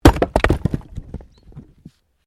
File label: Wood Chopping Axe; Objects